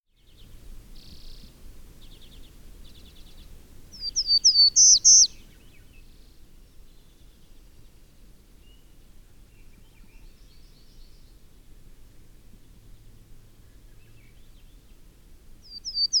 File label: Warbler